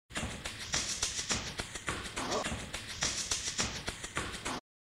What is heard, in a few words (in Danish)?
Sang; Samba